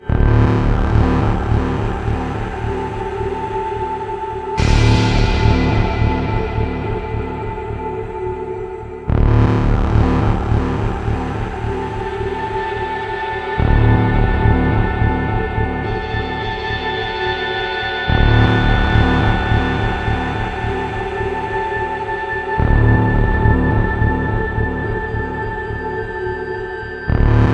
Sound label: Industrial District Pre-Race; Full Auto 2: Battlelines